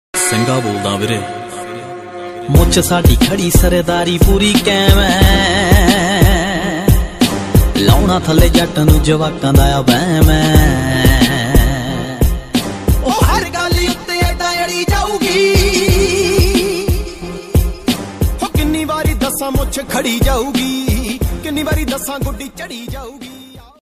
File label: Punjabi Ringtone